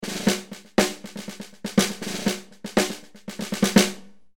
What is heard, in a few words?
gretsch